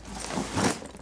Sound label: Duffle Smack